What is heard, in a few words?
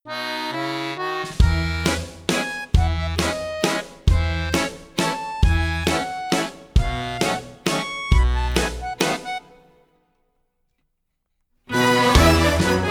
Hygee Backing